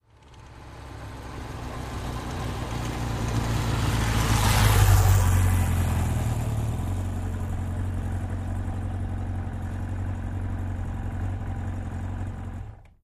Motorcycle, Kawasaki 750, By Slow, Stop Medium Distant, Idle, Off